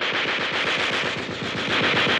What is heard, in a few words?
machine gun; Crash Bang Boom